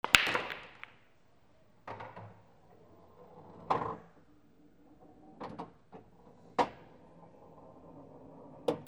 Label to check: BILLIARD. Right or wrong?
Right